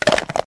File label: megalodon damage